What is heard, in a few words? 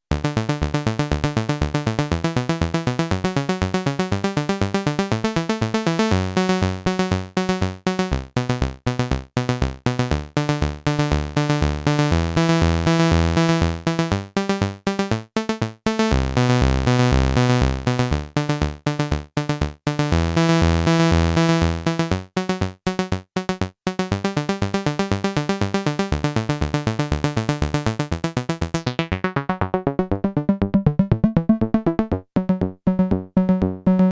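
basso, Compact analog monophonic synthesizer; bass 8 bits sequence; MFB nanozwerg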